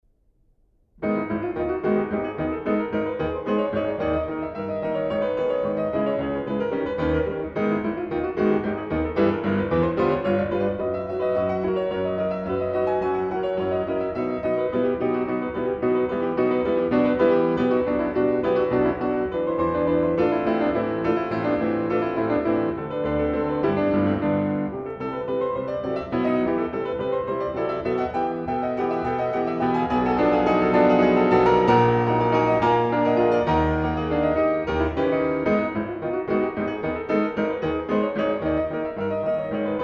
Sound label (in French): prelude en sol diese mineur presto; Frédéric Chopin